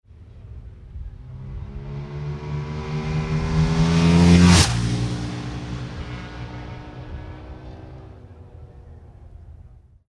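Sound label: Ducati 998 single; moto; Ducati 998 - passage 2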